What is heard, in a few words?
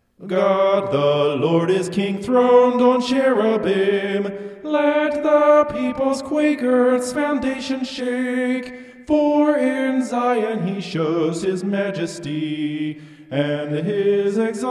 vocal homoph; psalm 99